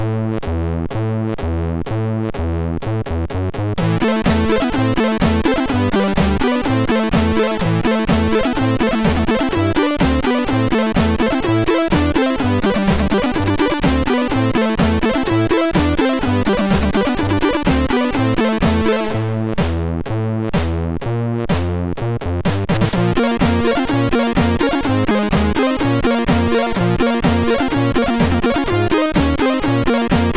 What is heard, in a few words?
sid, MOS6581R3; X 14 Party Report